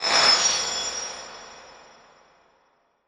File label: Title Transition Glass Element; Production Element